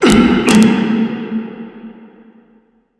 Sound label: gasp